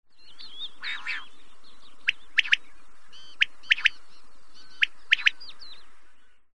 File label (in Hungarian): furj